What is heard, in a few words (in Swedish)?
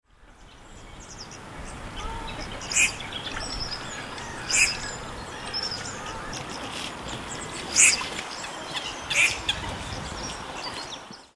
rodhuvaddykand